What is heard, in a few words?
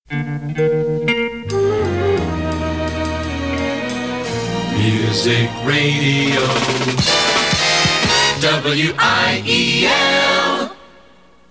jingle